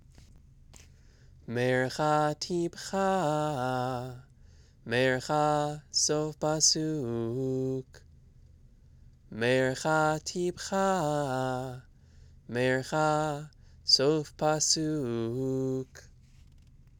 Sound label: sof pasuk, trope; Old Haftarah Sof Pasuk clause; Trope Families